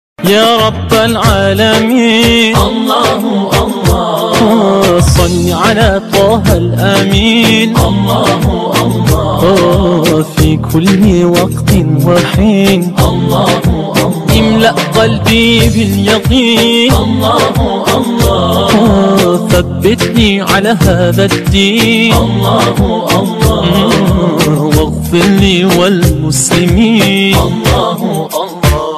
ya rab arabic ringtone, islamic; islamic ringtones

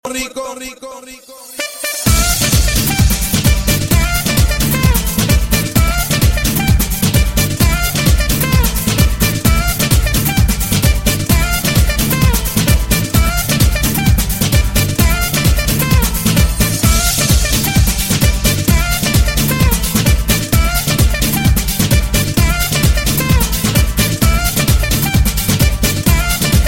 club mix ringtone

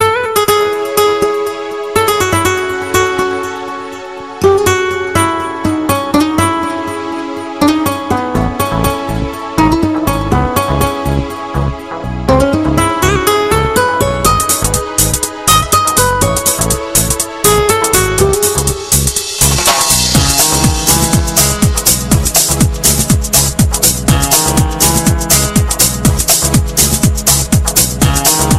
bollywood songs instrumental love music ringtone

